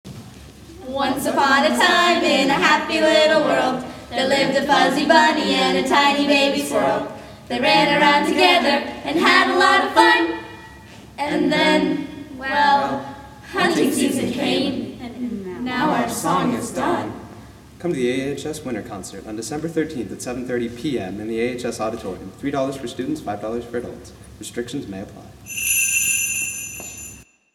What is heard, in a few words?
Jingles